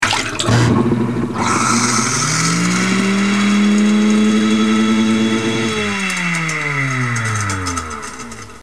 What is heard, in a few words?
disposal